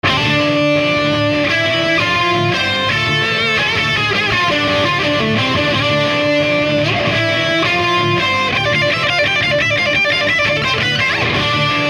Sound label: Fryette Pittbull 100CL Dean Lo Hi Gain